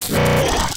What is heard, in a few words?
pain; custom monsters; glitchzombie